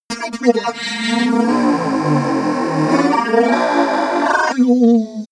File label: monster glitch growl